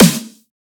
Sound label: snare deep; one shots; SEISMIC Drum Sample Pack; Slate